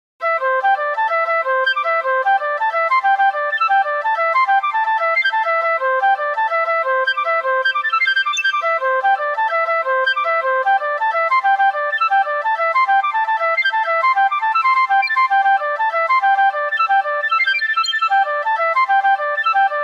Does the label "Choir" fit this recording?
No